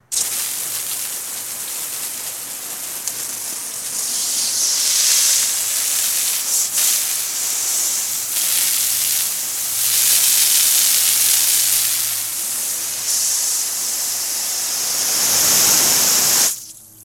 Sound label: Water Spray Hose Noz